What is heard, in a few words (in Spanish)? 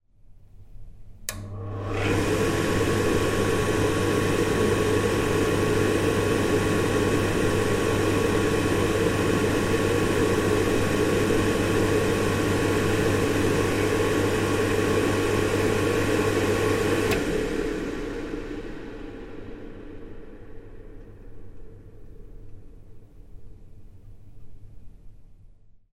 secador de manos con autoencendido